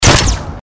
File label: Combat Shotgun